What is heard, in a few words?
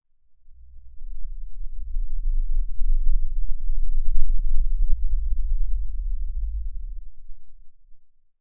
non m, warping